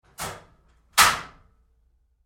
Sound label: Door Locker Open Roomy Med, Metal Door; Doors; BigRoomSound